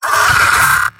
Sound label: medic pain severe; vo; tf2; mvm